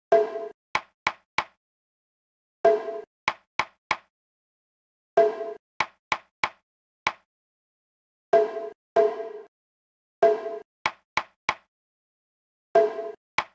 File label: mitsu uchi shime 95bpm